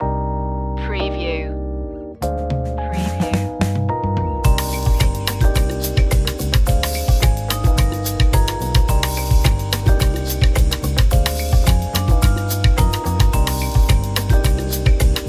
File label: summer lounge